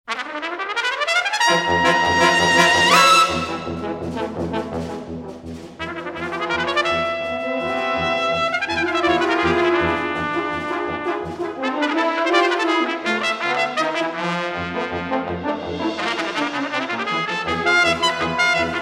Prism, Shards Of Color for Brass and Percussion

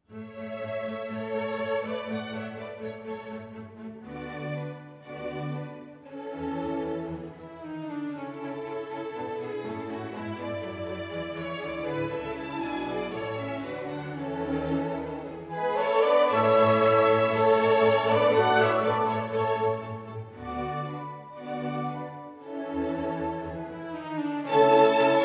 Clarinet Concerto in A Major, Allegro; Mozart

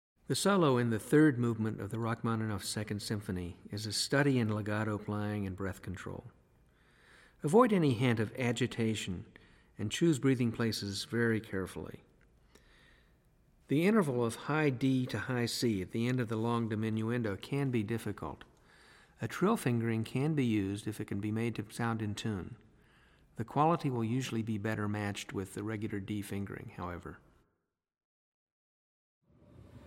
Symphony In E Minor, Clarinet

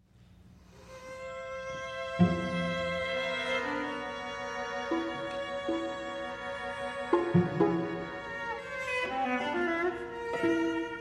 String Quartet, Lento; Walker (George)

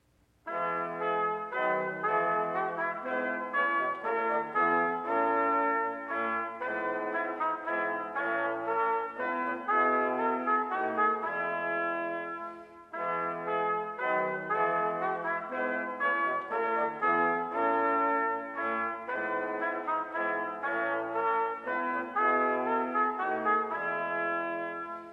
Menuet, Brass Quintet

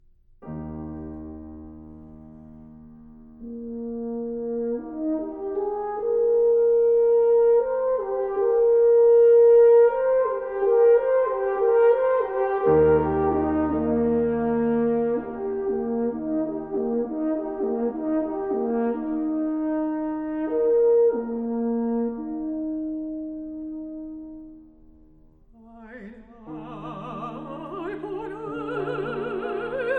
Alphorn

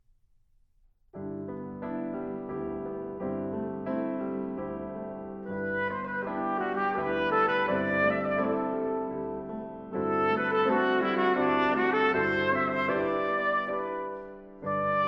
Vocalise, Trumpet Vocalise

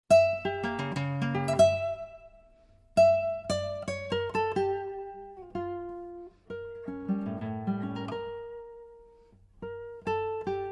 Guitar; Castelnuovo-Tedesco; 24 Caprichos De Goya

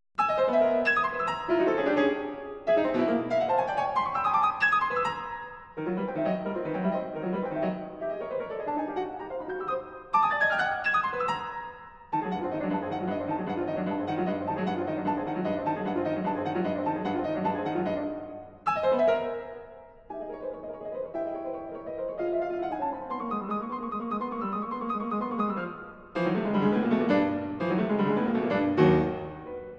Etudes, Tense, Piano; 20th Century American Piano Music

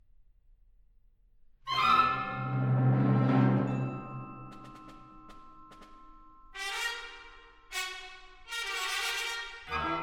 Concerto for Wind Ensemble, Perpetual Motion

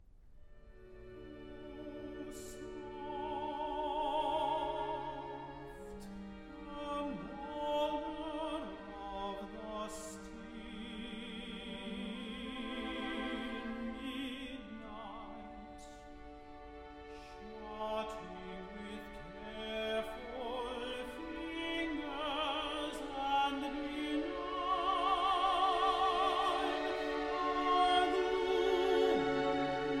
Serenade for Horn, Tenor & Strings, Sonnet

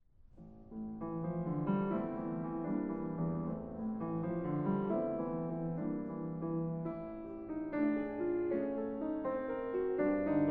Preludes, Allegro Moderato, Piano; 20th Century American Piano Music

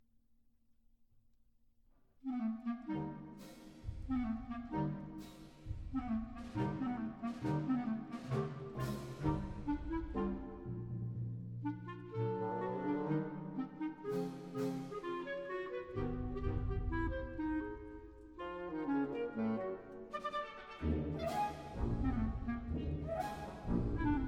Symphony For Band, Marches; 'West Point'

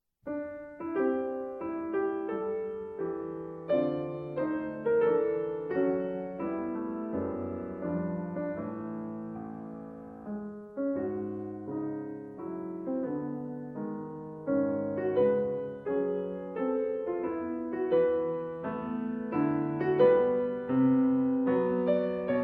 Sonata for Horn in F and Piano; Mvt. 2. Ruhig bewegt

